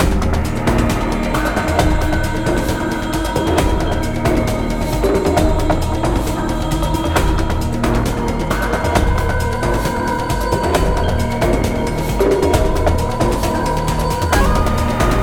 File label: Loop